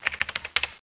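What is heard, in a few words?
keyboard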